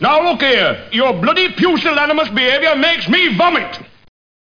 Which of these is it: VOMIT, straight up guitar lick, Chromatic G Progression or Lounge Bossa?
VOMIT